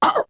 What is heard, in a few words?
Beatbox; ZERO G - Datafile DISC 3